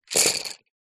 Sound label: office pencils x30 drop on carpet